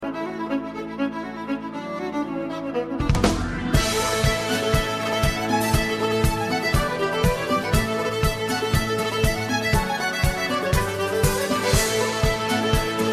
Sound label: Dancing Violins; Intermédiaire